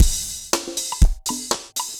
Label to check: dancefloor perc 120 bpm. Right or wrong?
Right